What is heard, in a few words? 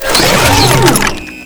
reload zonetrooper weapon